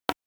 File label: Button